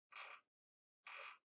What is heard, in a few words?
Portable Stereo Far Dial